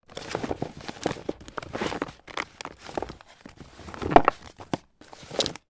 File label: household childrens books movement in bookcase